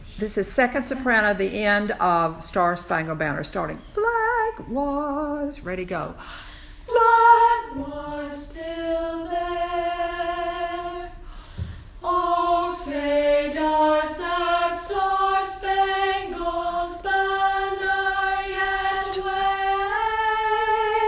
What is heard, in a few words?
Star Spangled Banner Sop Ending